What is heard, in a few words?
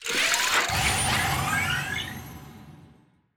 ladder Climb; netent